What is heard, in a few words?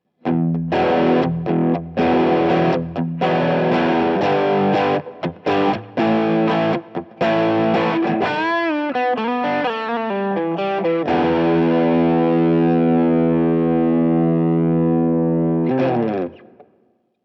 Boss DC 2 SDD Drive Stereo